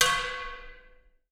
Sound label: Bar Extinguisher Ride, Drum Hits; Aberdeen Music Hall; Music Hall Percussion